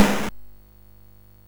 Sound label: sid MOS6581R4; Summix